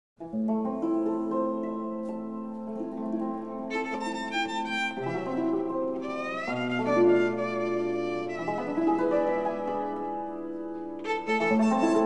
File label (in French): Tête a Tête Cymbalum et Violon, Musique Tzigane-Hongroise; Folk